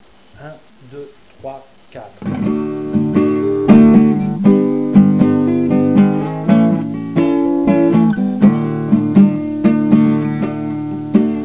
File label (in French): CALYPSO, guitare